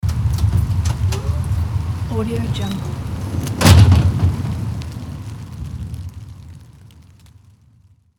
opening door in rain storm

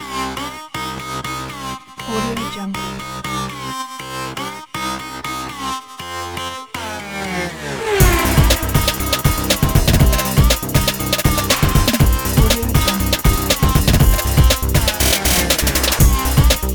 hot pursuit

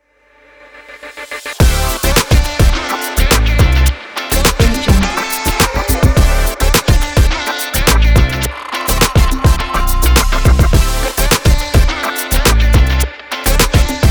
funky show opener logo

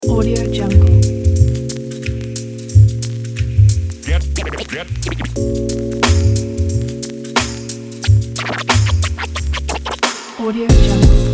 hiphop jazz

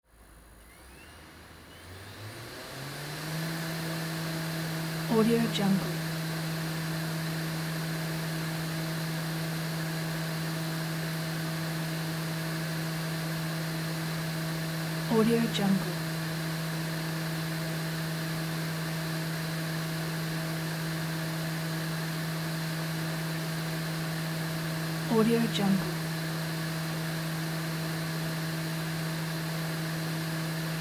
cd rom drive high speed spin; mountainaudio

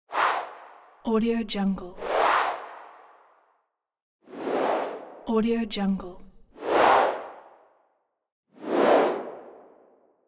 sweep

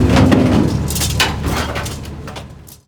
industrial garbage truck; soundmorph

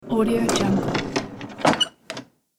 old wooden drawer close

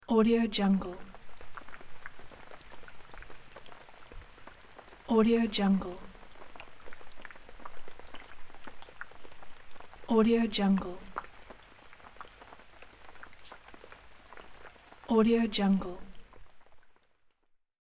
rain cloth fall bubbles

